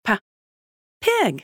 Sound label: PIG